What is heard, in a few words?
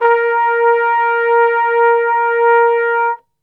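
A#2, Flugelhorn, Brass; Best Service; Brass Super Section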